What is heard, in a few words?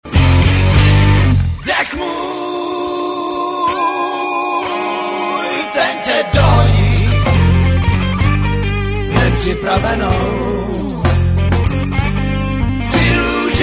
blues